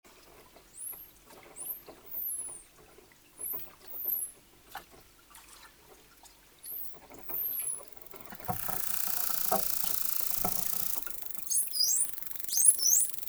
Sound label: Dolphin